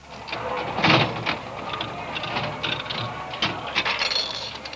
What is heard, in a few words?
casino; WAV Sound Effects; parks